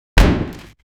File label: a heavy object slamming into the ground with a loud thud